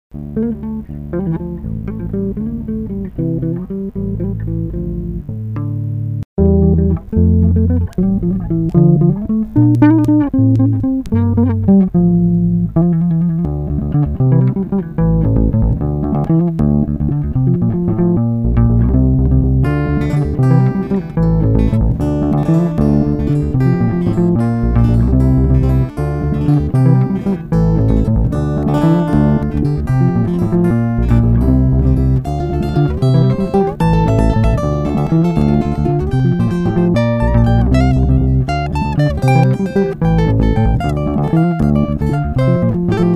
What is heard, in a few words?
Bas